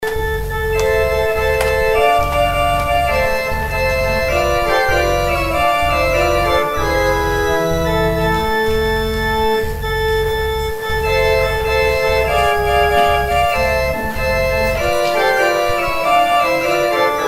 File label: organ interlude; Organ Interlude during the offering